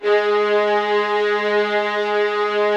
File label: VLN G#3, LOUD VIOLINS; Q Up Arts; Denny Jaeger Master Violin Library